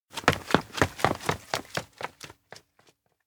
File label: steps indoor post office run away thud; koyomi; anemoi パッケージ版 1.1